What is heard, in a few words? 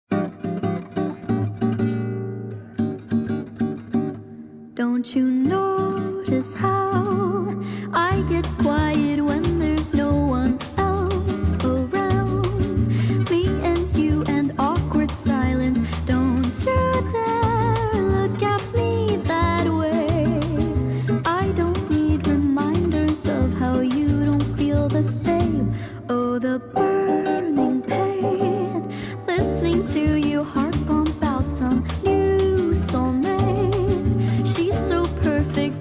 sped up reverb